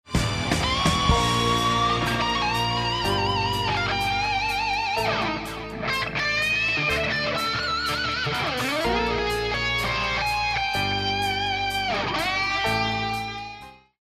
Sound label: lost round; music kits custom